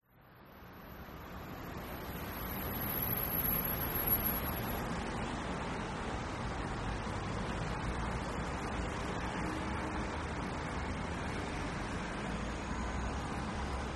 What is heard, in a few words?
traffic Munich City Germany